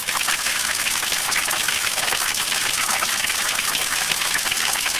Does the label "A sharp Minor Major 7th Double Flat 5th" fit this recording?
No